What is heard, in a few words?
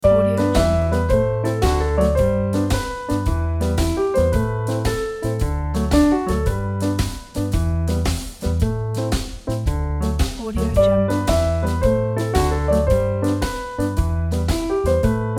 happy music for animated film; shvangiradze